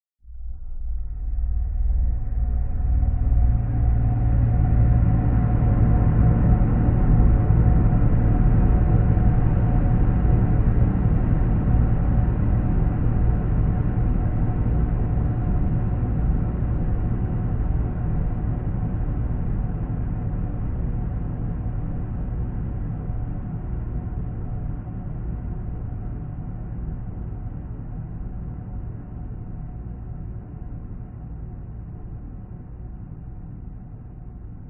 sound design drone dark sinister fearful long decay; zapsplat